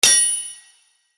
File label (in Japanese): 剣ぶつかり合い